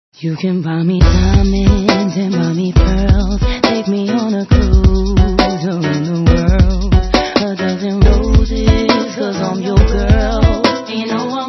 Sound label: remix